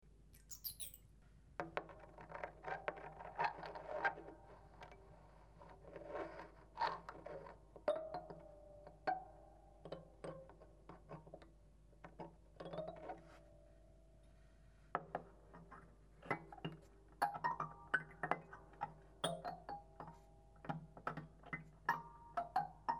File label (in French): peigne, harpe